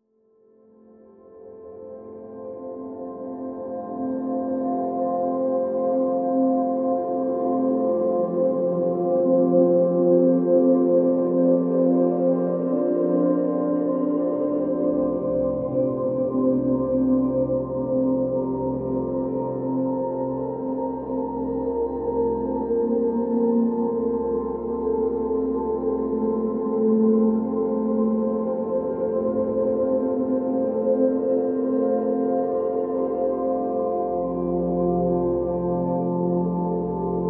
Pads Celestial (F)

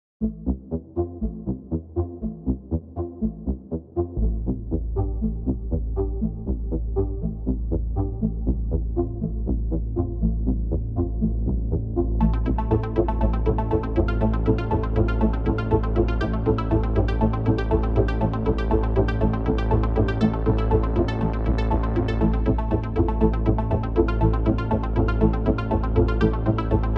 Synth

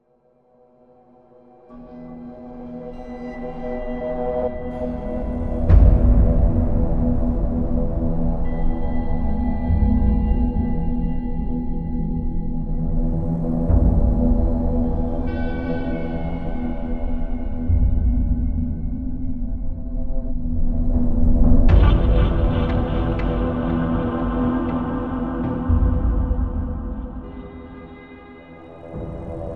Dark Drone

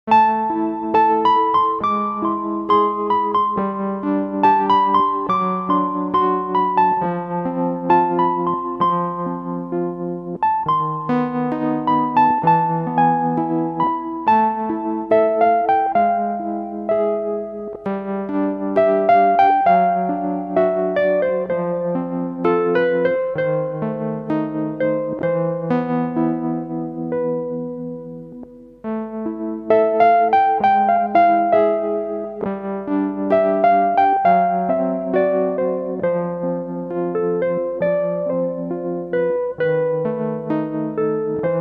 Melodica

